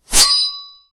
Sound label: sharp ancient sword whoos